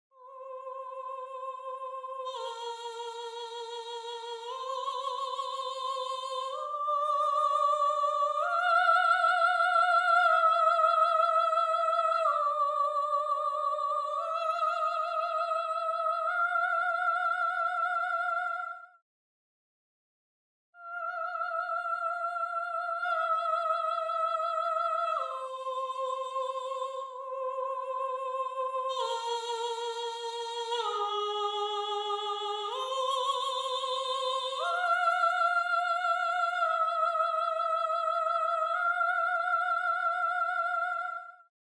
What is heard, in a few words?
Soprano